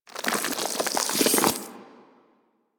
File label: Flesh Door Close; Ambience; Props; Warframe; Infested Eris